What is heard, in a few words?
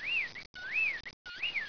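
whippoorwill; 10,000 Graphics Pack Volume 1; Media Graphics International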